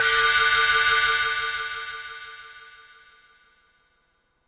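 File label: Yamaha RS7000 hihat cymbal, Drum machines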